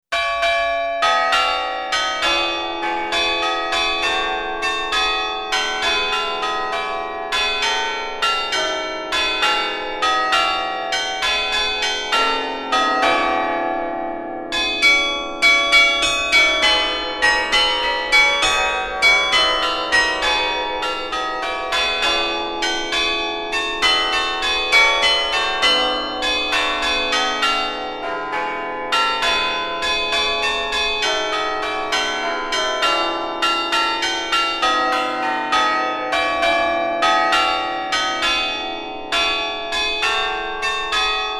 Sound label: carillon